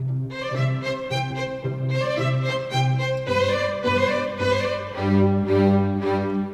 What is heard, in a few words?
ORCHESTRAL